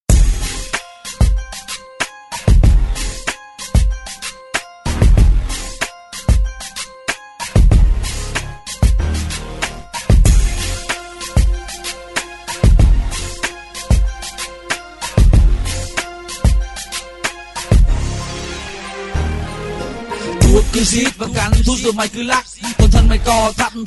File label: rap; viet rap